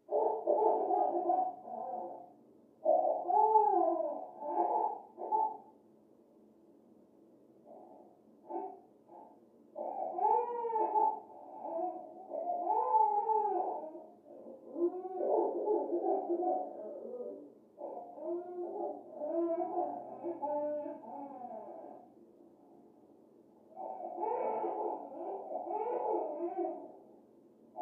Baby; Crying, From Down Hallway.

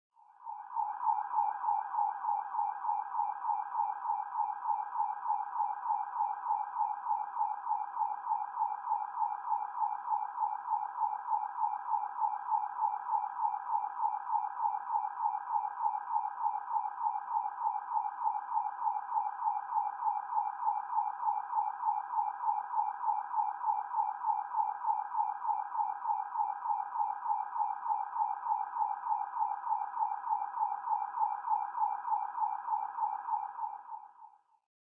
Siren; Fast Police, Through Closed Window.

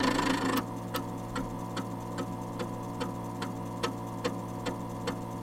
Emulator I Disc Load, computers

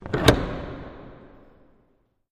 Bolts Var Reverb, latches

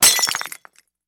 Glass Breaks Light; windows & mirrors

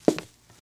Footstep, High Heel On Hardwood

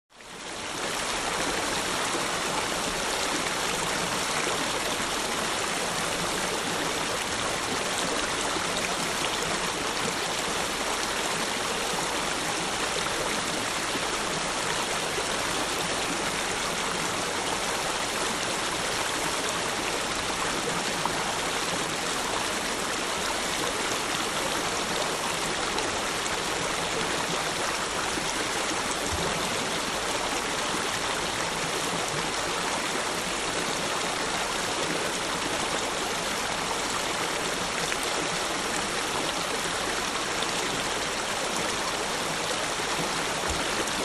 Stream Small Amb, streams

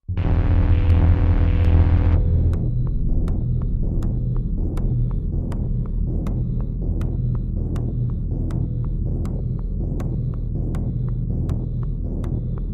Breath Clock Machine; clocks

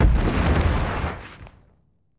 Dragon Falls, dinosaurs

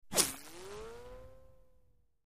Left To Right Tonal Bu, bullet bys

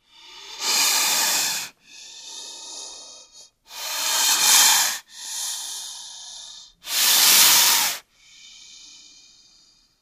Snake, Bull Breaths, Multiple. Close Perspective.

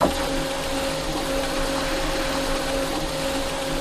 Dot Matrix Printer F; printers

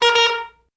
Single Car Horn Honk

